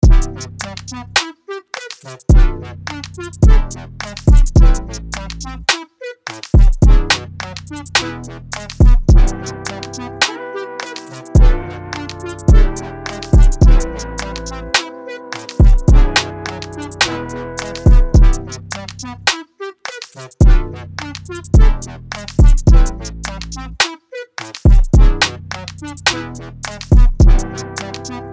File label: 106 BPM Am; UNISON DEMO; Major